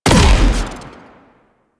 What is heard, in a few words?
Grenade Launcher; Warframe; Weapons; ThanoTech